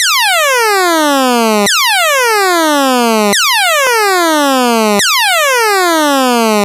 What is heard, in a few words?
Game Boy FX Alarm Loop, chiptune; Nintendo Game Boy